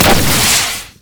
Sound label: buster shot; rocket tristana